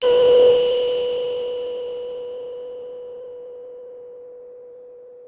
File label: SYNTH; Sentinel